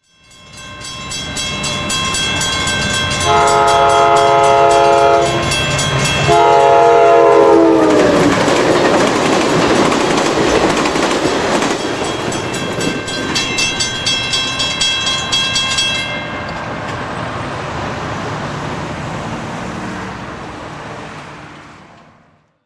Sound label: caltrain doppler stereo